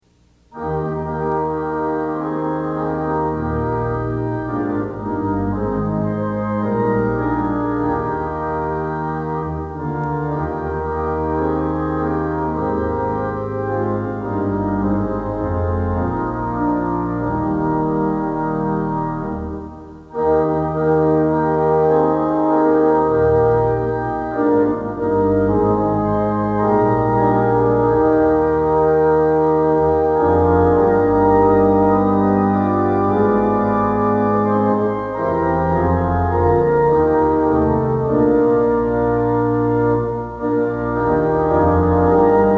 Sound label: O Zion, Rise in Glory, Organ Music for Worship Services; Twenty-Eight Liturgy Hymns